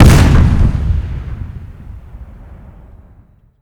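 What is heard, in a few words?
weap ac130 105mm distant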